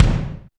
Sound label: BONUS BD, Drums; Best Service; Real Mega Drums VOL-1